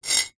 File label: industrial trowel metal short scrape on tiled ground